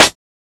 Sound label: 00s subtle reverb acoustic snare g sharp key; snare drum samples